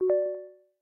Lock

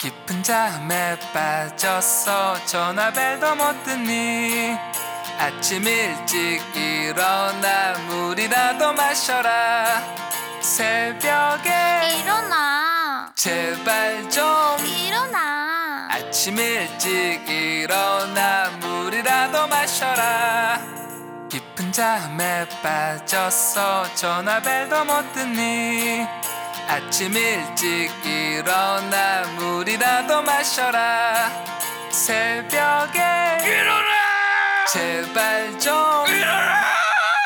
Small Spring, alarms; LG G3 Screen F490L

